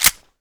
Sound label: slide forward, weapons, OTS-33